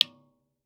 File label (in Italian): ir magnetostrittivo, dml vetro